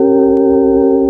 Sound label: Warbly Organ ( Single Note); Movie Bits